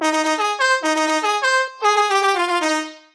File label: funny car horn La Cucaracha